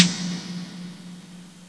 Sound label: Drum